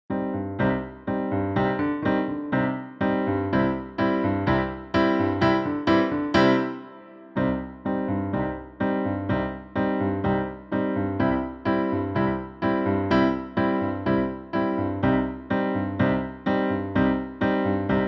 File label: solo for gent chords